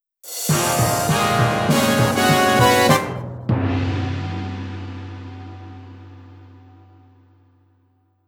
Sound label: Appearance Jingle